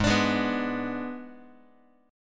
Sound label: G sharp Diminished G sharp, down, Guitar; strummed; 4A,4E,5A,5D,5G#,6C#; x,4,5,6,3,x